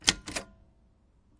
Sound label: keypress, Typewriter; UNUSUAL KITS and FX; Bauhinian Vibes Typewriter Kit